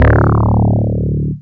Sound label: Simple Synth Bass